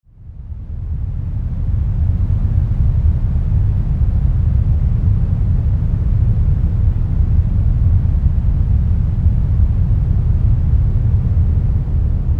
Rumble Noise Soundscape; Rumble Rest

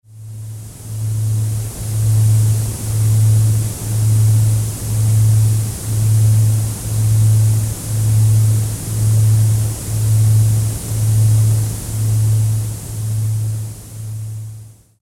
Binaural Tones Delta 1Hz with Noise

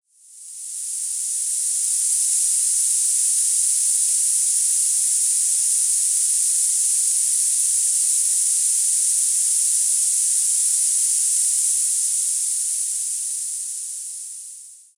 10 kHz Noise Masking; Tinnitus; Tinnitus Masker

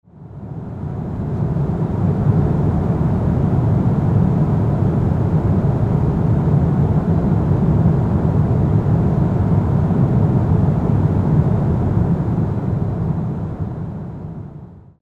Airliner Flight Noise Sounds

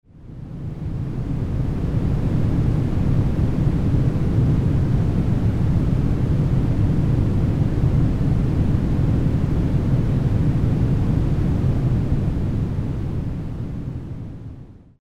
Curry Noise

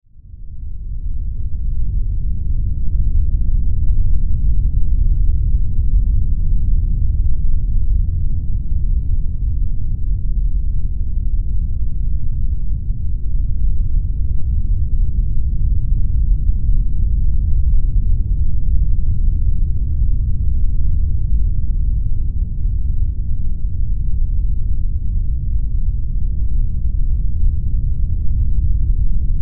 Bilateral Brown Noise

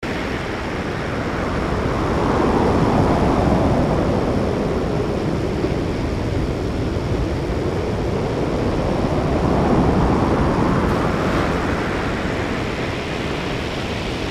Sweeping Green Noise

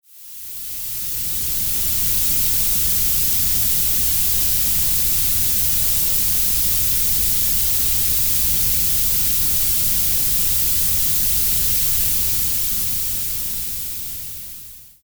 lavender noise

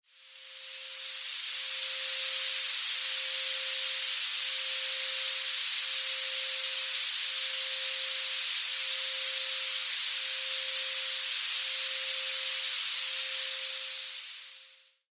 violet noise 528hz binaural tone; solfeggio

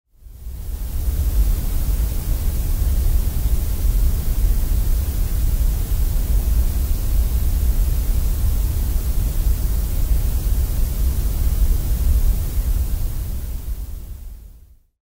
grey noise; ambient

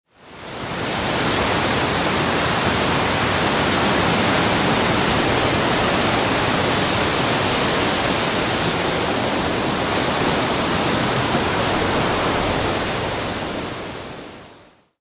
green noise; saint patricks edition

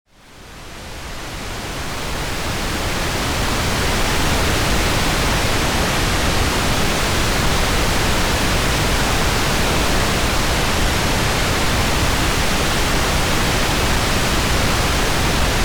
Bilateral Pink Noise; bilateral noise collection

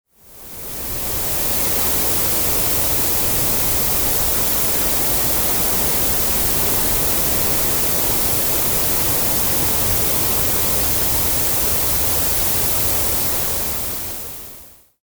blue and green noise

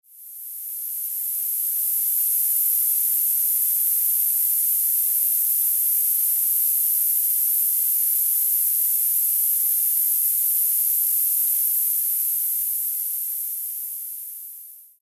15 kHz Masking Noise; Tinnitus; blocker masker; Tinnitus Zinger

